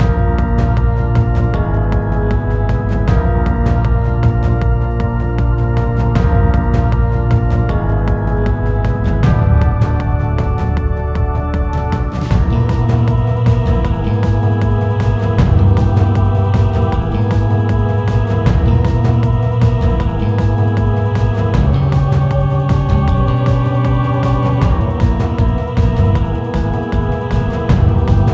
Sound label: Boss Music; primal light linux macos switch windows xbox one xbox series xs gamerip 2020